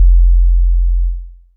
Sine Kick, Drums - Kick

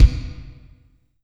Bass Drum

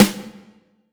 Snare Drum

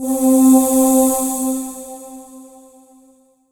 Korg TR Rack Vocalscape C4

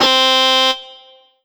Yamaha TG100 Distortd C4

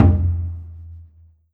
Korg NS5R Taiko

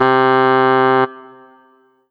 Ensoniq ZR-76 Bassoon C3

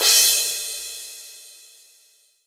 Korg N1R Crash Cymbal

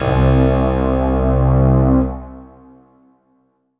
Korg M3R Sonata 1 C2

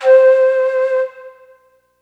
Ensoniq SQ-1 Forest Flute C5

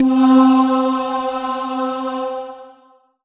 Alesis Sanctuary QCard Tines Aahs C4

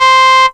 Korg DW 8000 Harmonica C5

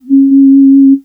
Yamaha DX7 Whistles C4

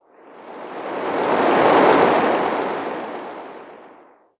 Casio CTK-611 Seashore

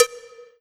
Yamaha TG100 Cowbell

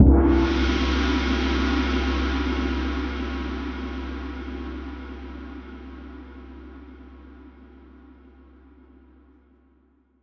Korg N1R Gong C2

